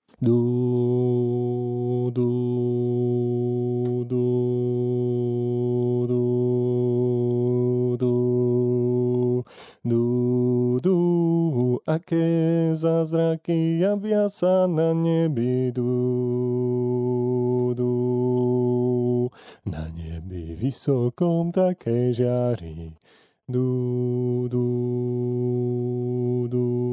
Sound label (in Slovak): bas; vstavajte pastieri